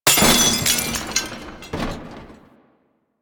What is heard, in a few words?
Desktop Glass Metal Destroy; ambience; Warframe; Entrati